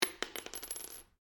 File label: Cartridge 22 Caliber Long Impact Polished Wood Stereo; shell casings of different calibers impacting on different surfaces